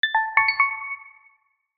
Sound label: Alert, notifications; Magenta; Telstra